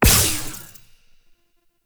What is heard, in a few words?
Corruption Pickup Small; Warframe; Missions; Zariman